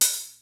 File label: MEGA KIT, DRUMS; Kid Nepro; Elite